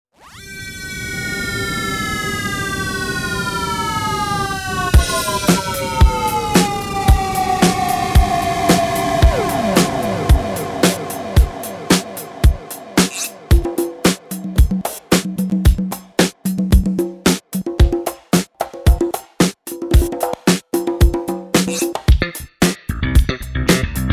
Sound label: instrumental